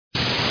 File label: storm; citystorm